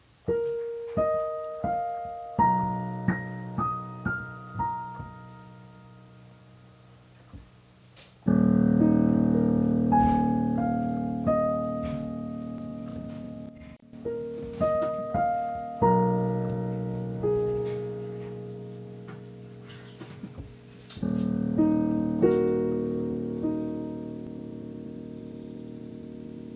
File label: Piano; ゆめ2っき